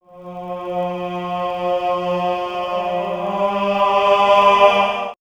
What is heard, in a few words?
Monks Gregorian; vox mix; X-Static Goldmine